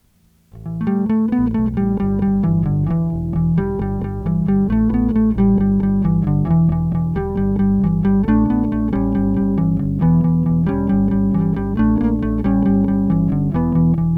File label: bass sketch; Rode